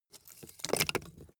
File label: wood logs small fall